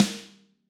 Snare Hard

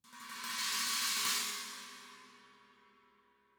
China Flourish, cymbal; SAA XCC 18; apulSoft apTrigga2; Multi-Velocity Cymbal Samples

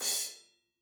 Crash Choke, cymbal; apulSoft apTrigga2; Multi-Velocity Cymbal Samples

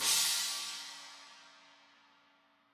China, cymbal; SAA XCC 18; apulSoft apTrigga2; Multi-Velocity Cymbal Samples

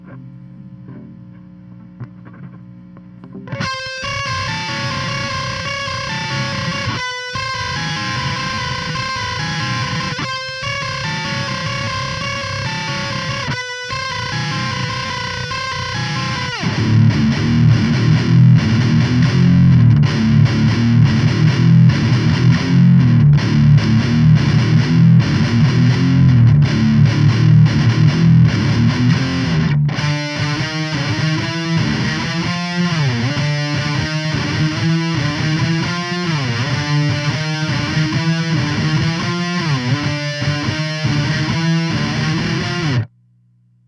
guitar ending

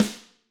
Snare Hard

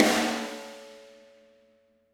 Snare Crack

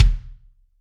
Kick Hard, Drums

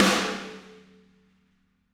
Snare Crack

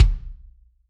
Kick Hard, Drums

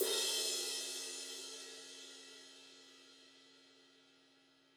Ride crash; apulSoft apTrigga2; Multi-Velocity Cymbal Samples

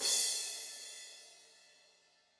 Crash, cymbal; apulSoft apTrigga2; Multi-Velocity Cymbal Samples

